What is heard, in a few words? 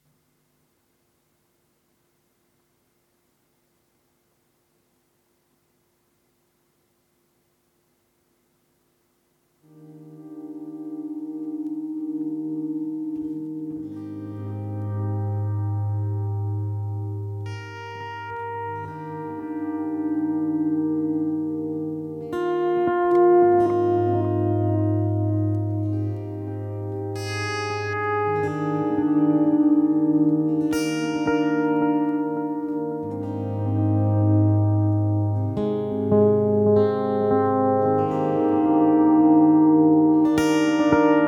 D 100 GTR Vol Ped impro